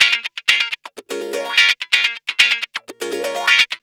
GUITARS 125; House; Best Service ProSamples vol.10; AKAI

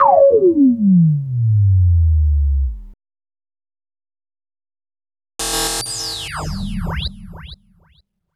LFO FX; Sonic Foundry (Sony Creative Software) - Club Joints and Street Anthems; Time Traveller

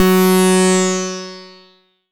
Dance Floor Bass, Multisample Basses

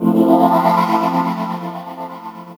CHORD, Drum & Bass, 145 BPM; Ueberschall; Drum & Bass Flash Trackz

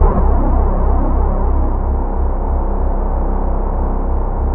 MOVE BASS; Masterbits; Vintage Voltage

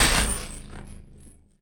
Perc Hit, drums; Drums & Drones

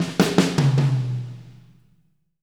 Fills 75; Zero-G; Monster Beats

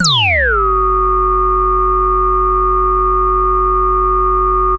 Moog Pro; Vintage Voltage

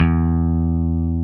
Basses, Roland

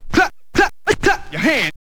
scratch kit, vinyl

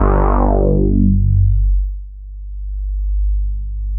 bass, synths

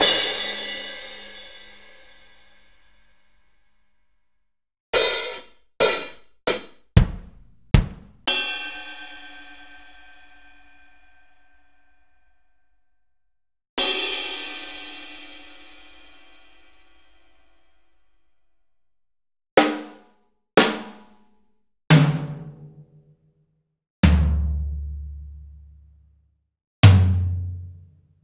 Akai MPC500 Room Drums

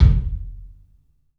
KIK, LIVE CLUB KK, Drums; 3D Sound

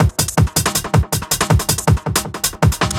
Acid Beat, Drum N Bass; Sonic Foundry (Sony Creative Software); Knutrix Drum & Bass

